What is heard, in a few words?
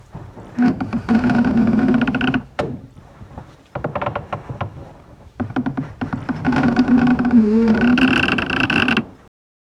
old wooden ships helm, nautical